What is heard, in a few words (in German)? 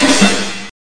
DRUM NEU